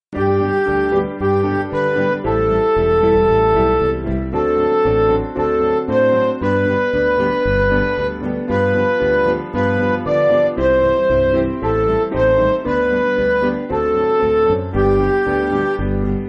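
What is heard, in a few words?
Midi, Music Backing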